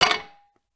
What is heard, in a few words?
hit fx; blacksmith clicker